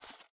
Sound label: Take Mouse; MiSide